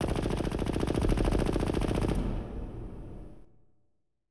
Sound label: dist gun burst